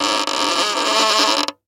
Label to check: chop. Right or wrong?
Wrong